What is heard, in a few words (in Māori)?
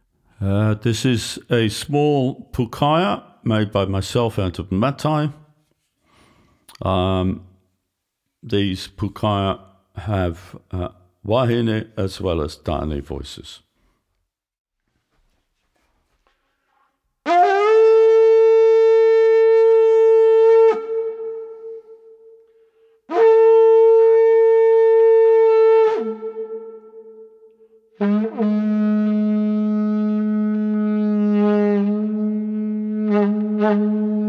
Pukaea iti